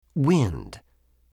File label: wind